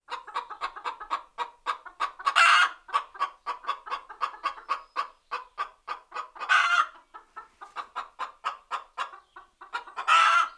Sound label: chicken